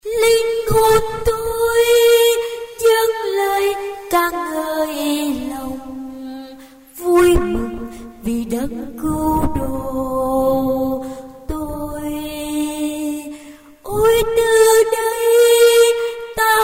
tenor basso

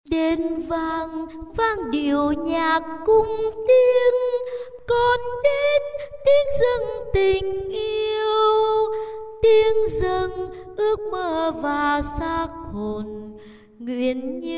basso

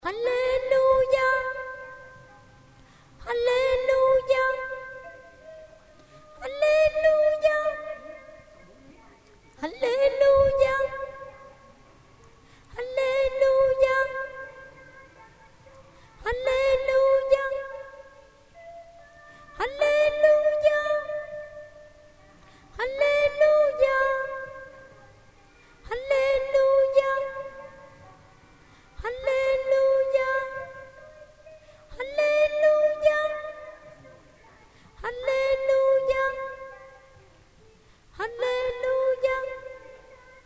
tenor

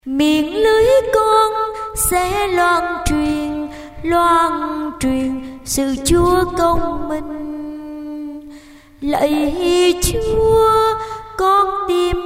sop alto

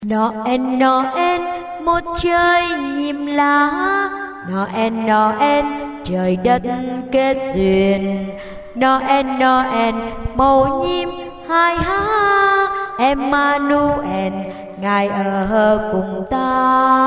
basso

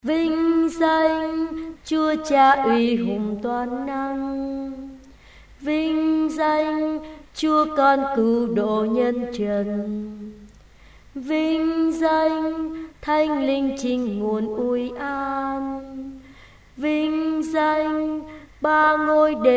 alto basso

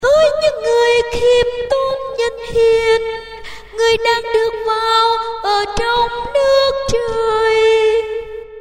tenor basso

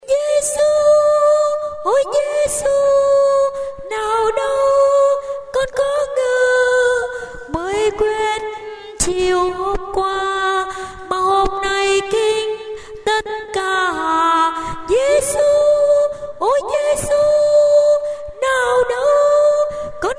tenor

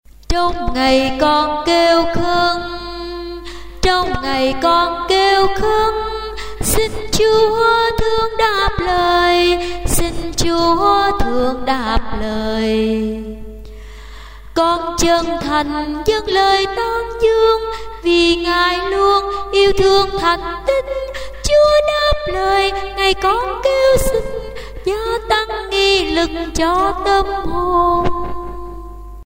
tenor basso

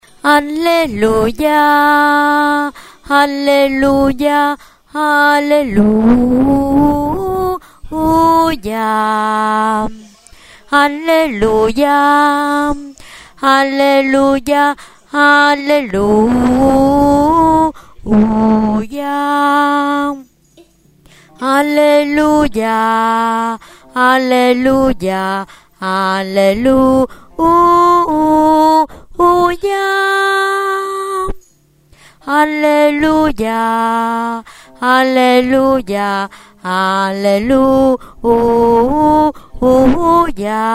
basso

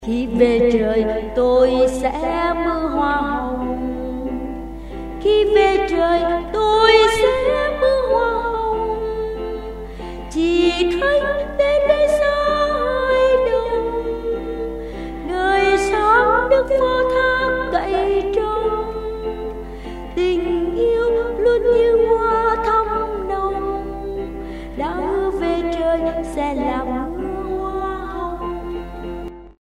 sop tenor

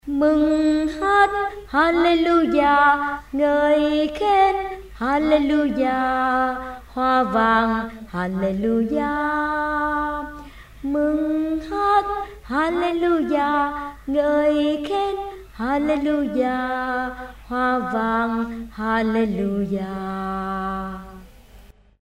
alto basso

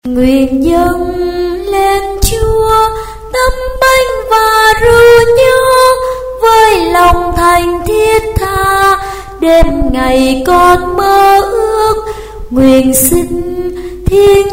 sop alto

